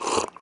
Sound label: drink; Minecraft; Conquest Default